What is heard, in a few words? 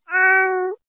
Cat, notifications